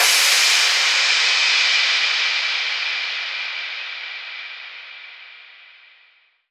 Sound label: Crash Cymbal, House; House Kit; Drum One-Shots; GhostHack; Ghosthack Advent Calendar - Day 3 - House Kit